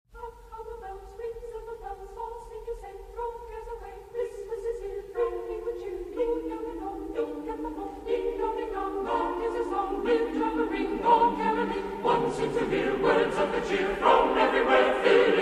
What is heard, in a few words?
Carol Of The Bells Deck The Halls